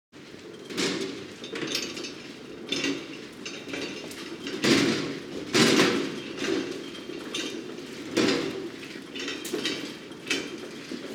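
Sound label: gurney being wheeled; Asian Show Sound Effects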